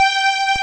ARCO STRINGS; OMI - Universe of Sounds

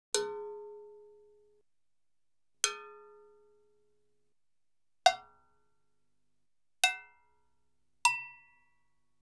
Salsa Picante; Masterbits; World Zone VOL-3

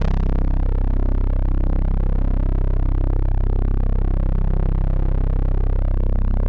505, String Machines

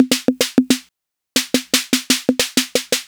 808 Snare; Sliced

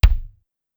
Kick, Clavia DDrum AT, Pitched Down

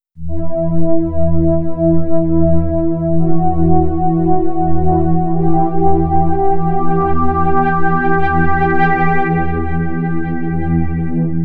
Pads, Electro Lounge; Sonic Foundry (Sony Creative Software)

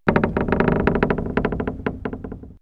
Creaking Door; X-Static Goldmine

